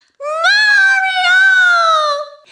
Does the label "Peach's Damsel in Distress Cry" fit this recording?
Yes